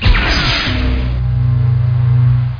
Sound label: Shield; MPG-Net (USA); imperium